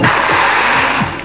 flush; sulaco

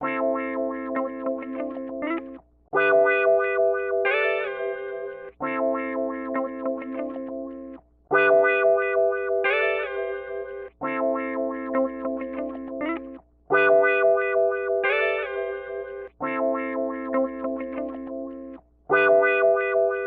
Guit; TOB STUDIO

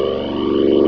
Turret Warn; Grid Warrior™ Demo

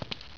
run

